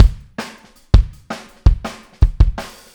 Kick, 162 BPM; Drums; Drums from the Big Room The Mixes; Take Your Toms Off; Verse

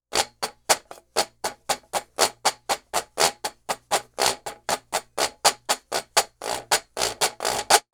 Washboard, Appalachian; Sonic Foundry (Sony Creative Software); Continental Drift Aftershocks

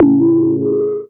Screamer Bass; Big Fish Audio; Old Skool House; OSH Bass

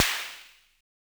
Snare, Univox SR-95

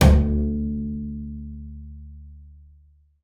BING D2, BASS FINGER; Xsample VOL-5

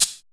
CABASA; SHAKERS

